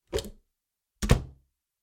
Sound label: Wooden Door Open Close; noise creations